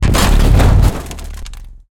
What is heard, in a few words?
annihilation mech mechanical texture sequence; bluezone; sonniss gdc